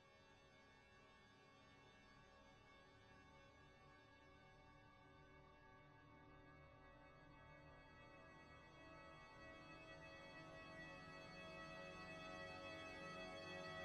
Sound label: Tension by Brass; BeautifulNoise; Intensity by Design